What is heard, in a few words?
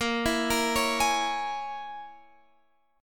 A sharp Minor 7th Flat 5th